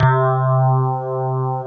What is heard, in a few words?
FM ELP C2, Keyboards Old School; USB Soundscan vol.09; AKAI